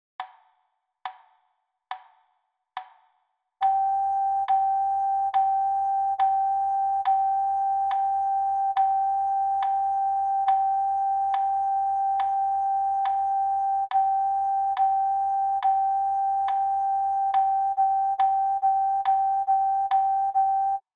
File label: Rhythm Practice G note